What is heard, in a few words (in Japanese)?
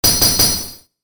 ランク レア度3